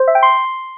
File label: success